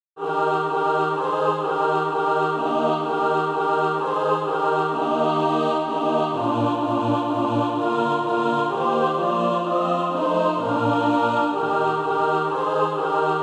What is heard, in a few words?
mixed